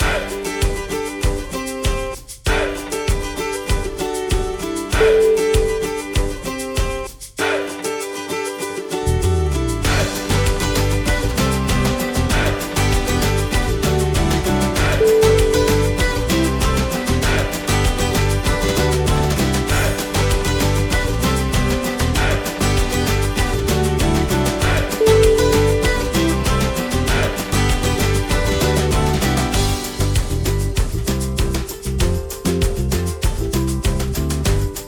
upbeat acoustic celebration

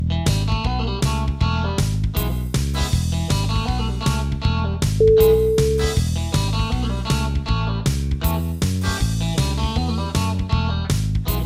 texas swinging

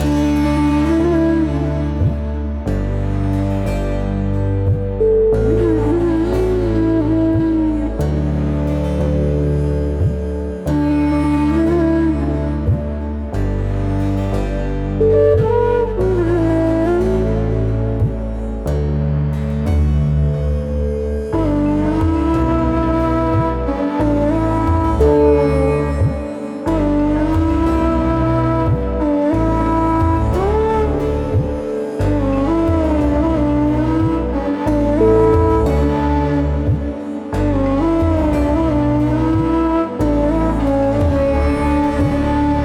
lyrical relaxing bansuri

